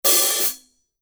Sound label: 17 sound edge hi-hat open, paiste 2002